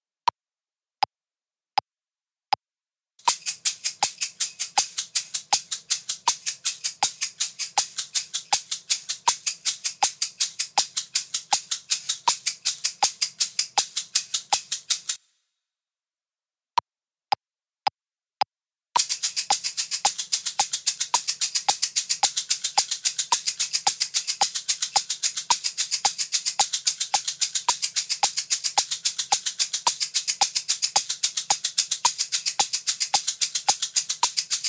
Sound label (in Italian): Con due shakers